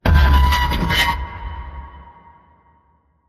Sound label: Spirit Howl